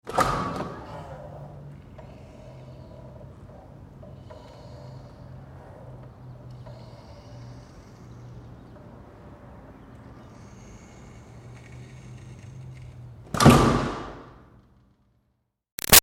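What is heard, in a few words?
Door Metal Commercial Push Interior Swing Moves; Metal Doors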